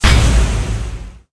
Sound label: missile; Smite; Zhong Kui